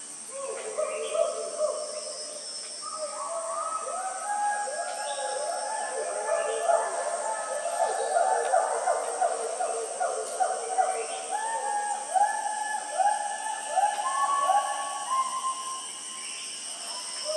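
western hoolock, This is the typical call of members of the Hoolock genus.; Lawachara National Park, Bangladesh.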